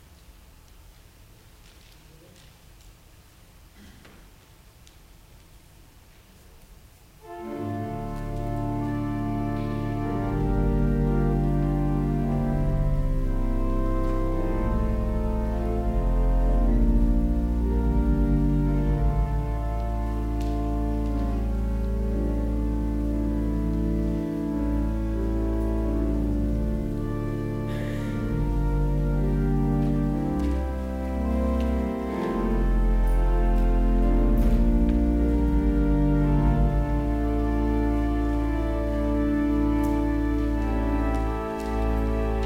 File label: Organ Music; The Lord's Incomprehensible Goodness